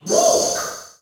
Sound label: heartbeat; disco danny